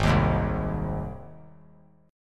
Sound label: Ab Major Ab down; strummed; JGuitar